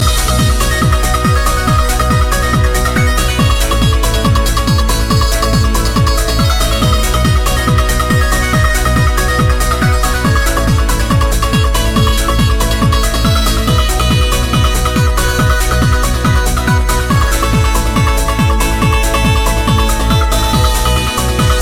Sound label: euphoria trance